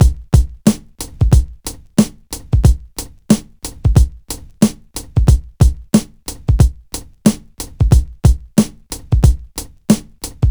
91 bpm breakbeat f key

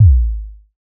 verby bass drum one shot A key; kick drum samples

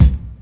original kick drum sound c sharp key

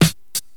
sparkle snare sample E key

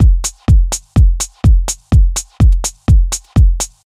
fat lazy tech house kick loop; drum loops

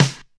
Dark Sounding Acoustic Snare D Key

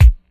sharp kick sample a sharp key; 90s; kick drum samples

